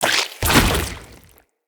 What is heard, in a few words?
Root Spider Mother Web Shot Cast; Animals; Spiders; Abilities